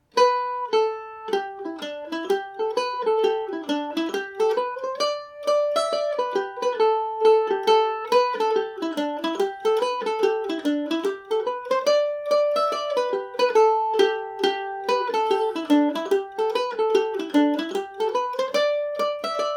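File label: G major, irish mandolin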